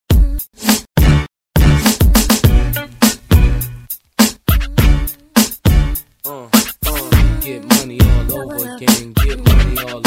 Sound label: Cool Beat